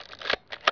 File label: servo; BESTSHOT; noisybit